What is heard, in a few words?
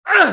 male pain; firefight